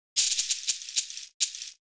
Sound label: shaker FX